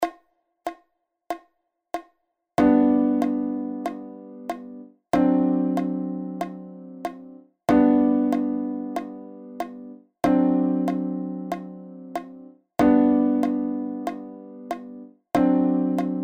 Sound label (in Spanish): CLAVE DE FA